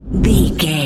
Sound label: whoosh slow deep